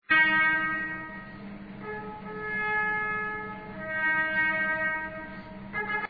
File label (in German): zapfenstreich